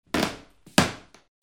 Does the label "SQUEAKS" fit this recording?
Yes